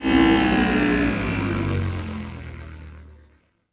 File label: shutdown, vehicles